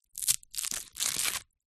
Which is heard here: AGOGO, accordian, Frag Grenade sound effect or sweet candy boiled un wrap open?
sweet candy boiled un wrap open